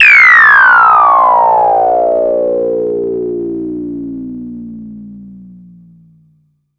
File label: fx mod fall; One Shot FX; Sample Magic; Transmission-X